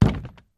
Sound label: Objects Fall Various